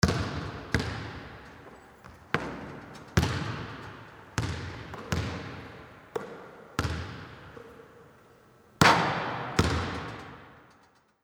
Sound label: basketball hits rim